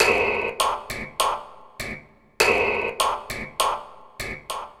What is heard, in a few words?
Spring, Acoustic Beats; Sonic Foundry (Sony Creative Software); Myths of Technology